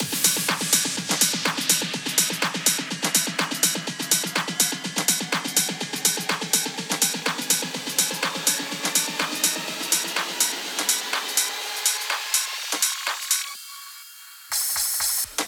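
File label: Build Up Progressive House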